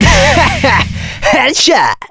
headshot

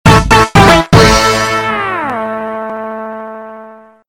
game over; tf2ware ultimate; music game; ds diy shuffle

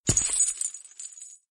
footstep; chriswalker; slender

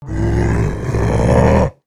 alert, male zombie; nmrih; zerosiki; slender

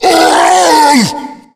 tank fire, voice pain

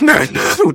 laugh happy; voice packs; scout

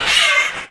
pain; itsteals; legs; slender

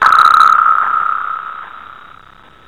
despawn; slender; zerosiki

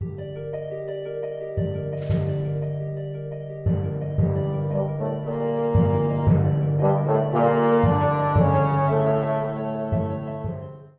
intro music; neon inferno; painiscupcake

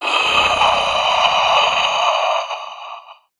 female zombie idle; nmrih; zerosiki; slender

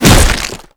hit; chriswalker; slender

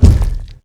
forest keeper step; lethalcompany; zerosiki; slender

